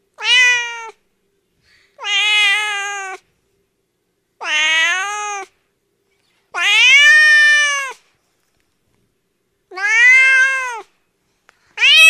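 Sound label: sound of cat meowing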